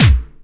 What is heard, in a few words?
Dance Drums